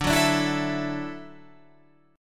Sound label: D-Major Add 9th D down Guitar Standard; strummed; JGuitar